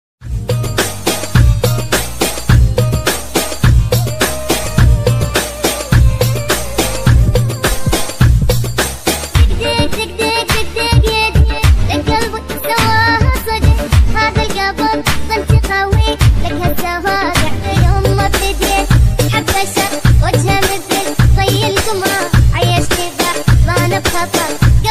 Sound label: Arabi remix bass